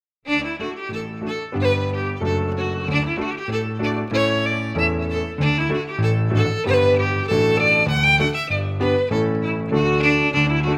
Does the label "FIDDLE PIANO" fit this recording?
Yes